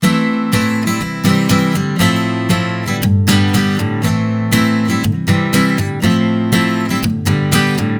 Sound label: 120 Pop Ac-Guitar Strum Amaj; Pop Rock; Acoustic Guitar; Pro Tools Essential Loops; Digidesign